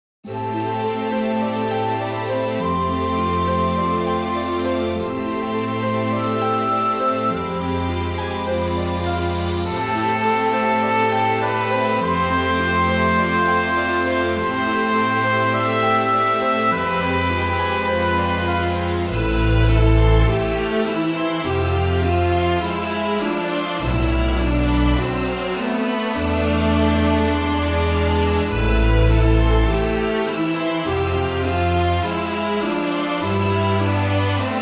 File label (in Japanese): 幻想の世界